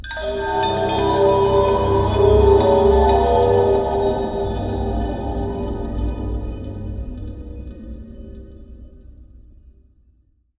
Bunker Dynamite Pickup

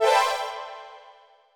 1-Up; Neo Atlas; PS1

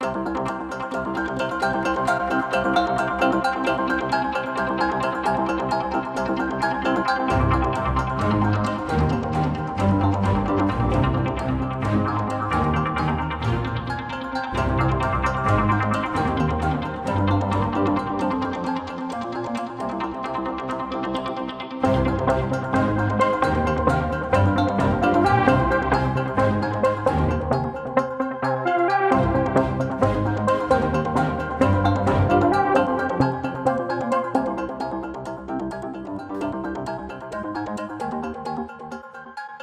Menu Main Loop; Wt Menu Main Loop Stem Edit

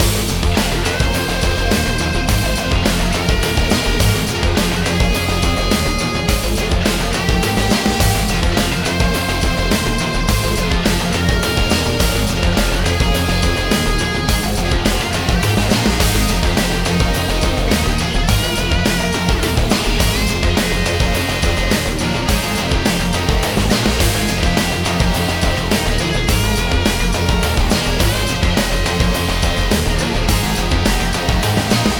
BGM Kowloon Park

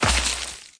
Footsteps

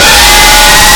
SCREAM

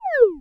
whistle disappear; sfx hud worldmap

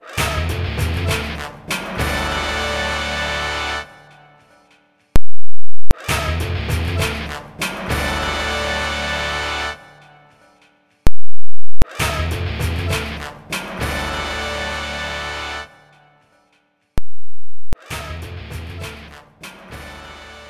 Cup Win; super mario strikers gamecube; Bowser Cup

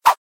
Popup In; Candy Crush Soda; Gofishing

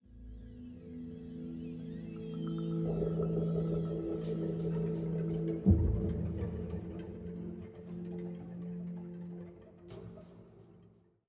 Military High 85bpm

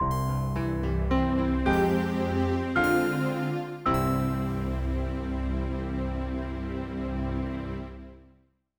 Sting

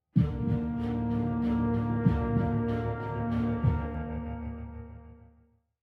190bpm; Legends of Pegasus; Windows gamerip 2012

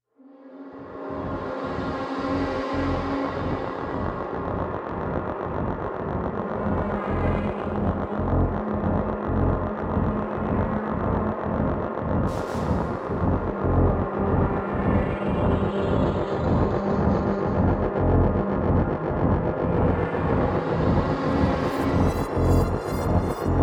Music Dark Hydroponics; Pollen

